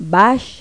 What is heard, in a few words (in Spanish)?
BAJO